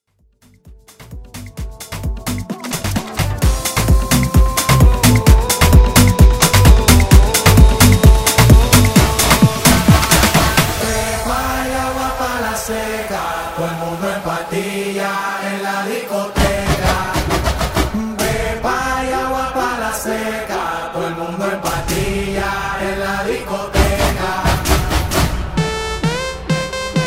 Afro Redrum

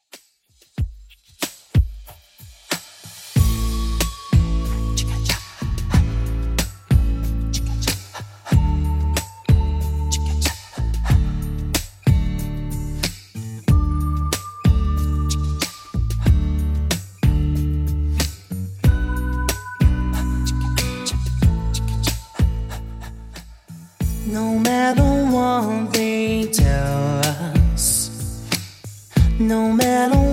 90s Redrum 93bpm

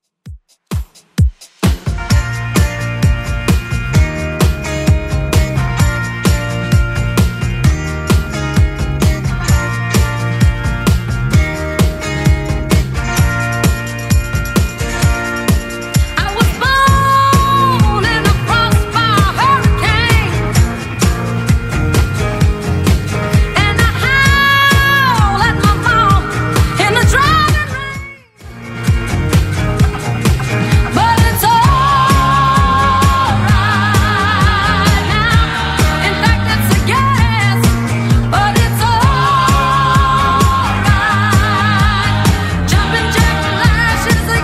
128bpm; 60s; Redrum

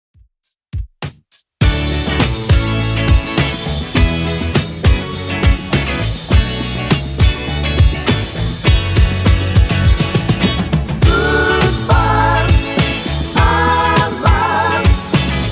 70s Redrum 102bpm

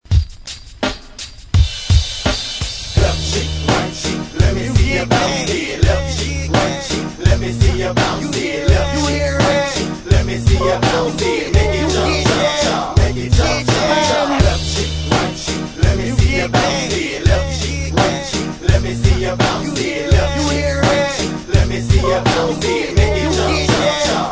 Rock

